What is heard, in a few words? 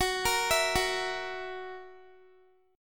Gb 7th Gb down Guitar, chord